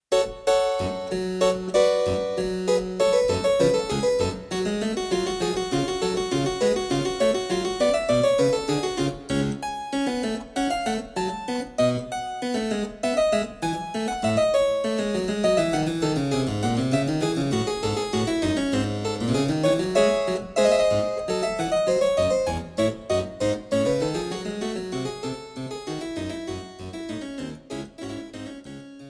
Yamaha CLP 725B Clavinova Digital Piano

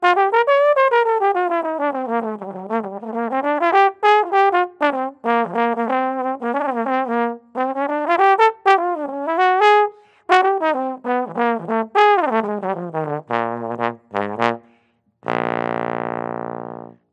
Yamaha YSL 356 GE Trombone

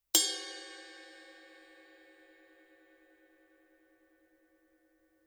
Color Sound 900 Blue Ride Paiste

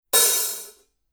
Color Sound 900 Blue Sound Edge Hi Hat Paiste

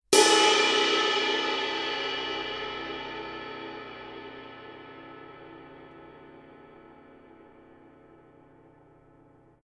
Color Sound 900 Blue Mega Ride Paiste

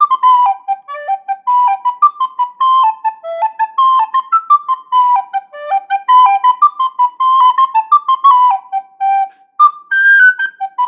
Yamaha YRS 23 Soprano Traditional

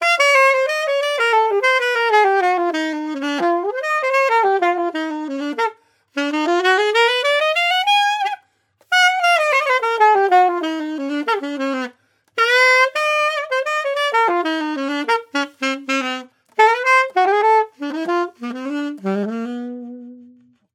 Jupiter JAS700Q Alto Sax

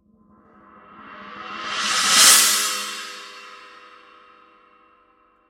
PST 8 Reflector China Paiste

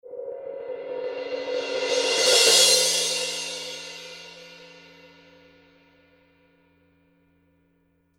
Color Sound 900 Blue Heavy Crash Paiste

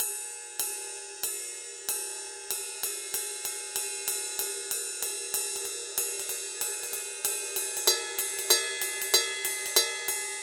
PST 5 Medium Ride Paiste

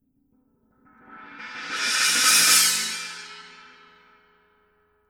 Color Sound 900 Black China

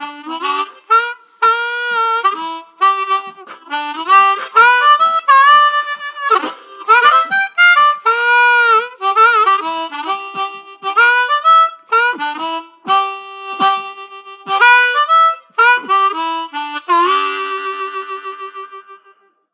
Hohner Meisterklasse Harmonica MS G

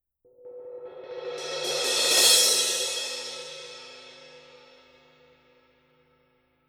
Color Sound 900 Black Heavy Crash Paiste

